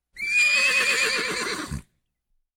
Miscellaneous farm horse neigh

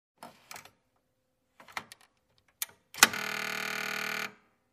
Buzzing sound

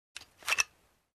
Taking magazine

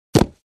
Packages being put down

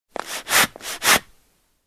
Pumping air twice

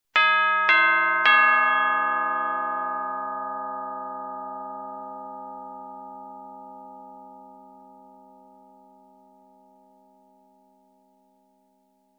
Doorbell melody played on tubular bells

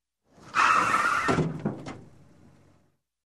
Car Crash with Brakes Sound

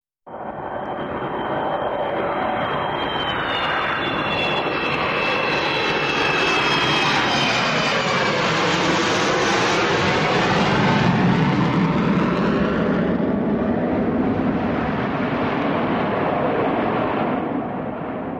Aircraft Ascending Whirring Sound

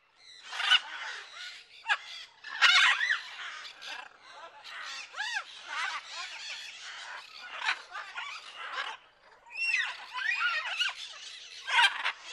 Squeaky birds and animal calls

